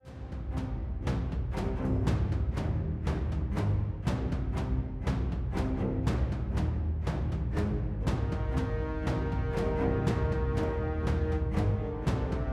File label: death march; sky jac; windows gamerip 2016